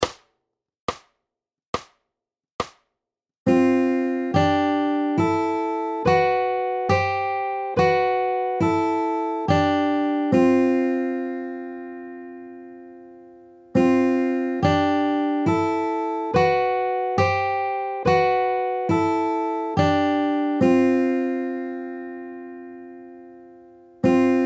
Progression, fingerpicking

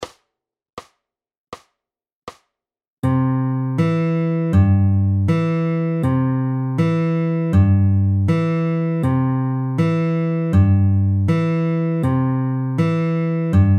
Trav C Bass, SLOW, fingerpicking

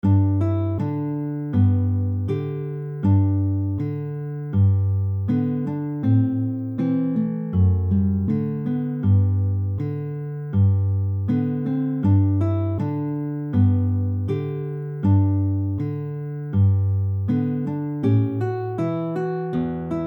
Chorus Slow, fingerpicking

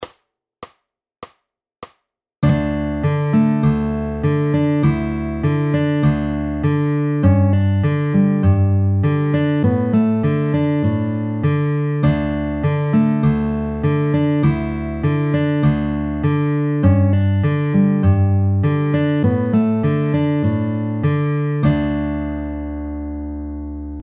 Drop D, fingerpicking

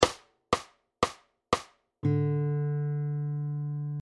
Travis fast, fingerpicking

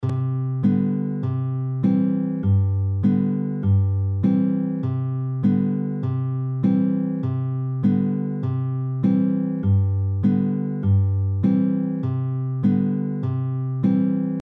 3 Finger Pluck, fingerpicking

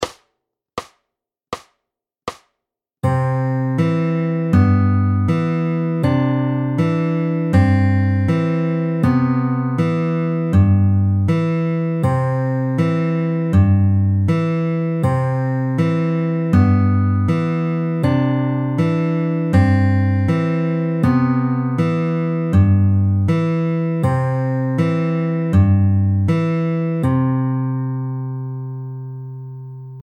Slow, fingerpicking

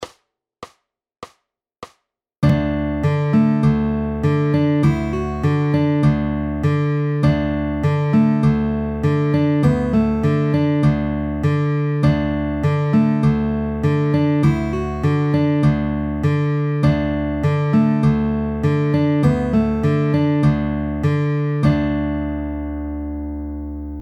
Drop D, fingerpicking

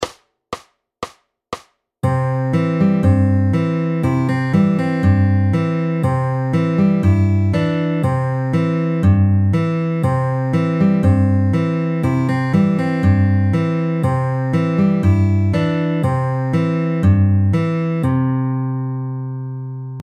In Betweens half quarter FAST, fingerpicking

